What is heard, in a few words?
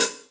Hihat Pedal Deep Snare Dynamics; Drum Hits; Standard Hits; Ableton; Factory Packs; Session Drums Club